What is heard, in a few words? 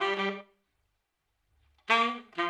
OLDSCHOOL BRASS 96